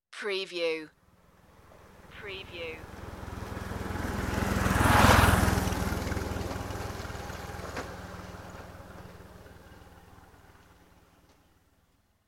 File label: passing motorbike